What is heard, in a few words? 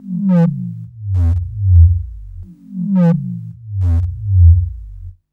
Lick Bass, Drum & Bass; Fluid Dynamics